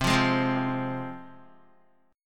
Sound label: C Suspended 4th Sharp 5th, C, down, Guitar; Csus4#5 strummed; JGuitar